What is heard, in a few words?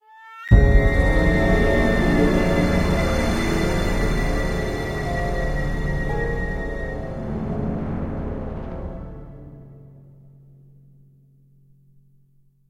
Uneasy Sting; jurassic park the game android ios ps3 windows xbox 360 gamerip 2011